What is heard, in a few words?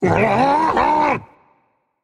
fear rage; chaac